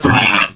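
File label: droid dead; Star Wars Knights Of The Old Republic I; XBOX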